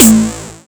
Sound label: snare